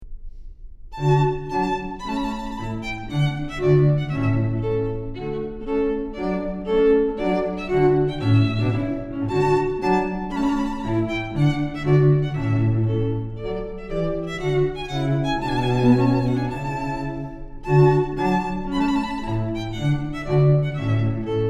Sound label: Trumpet Tune, Trio